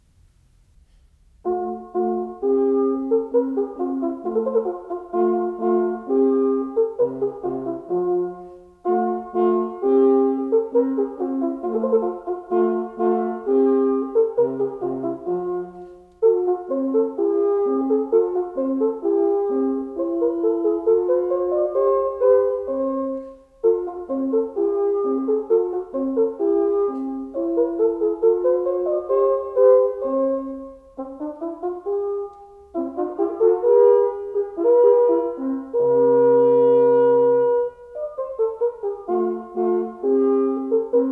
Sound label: Duos For 2 Horns, Allegro; Wolfgang Amadeus Mozart; Divertimenti; Serenades and Divertimenti for Wind; 12 Duos For 2 Horns, K.487